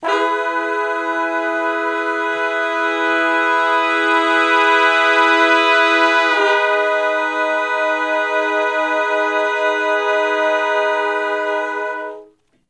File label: Slo Minor Blus Chords (IV,IV,I,I) (Ab); Sections; Crimson Blue and Fabulous Horncraft 4 RnB; Horncraft for R&B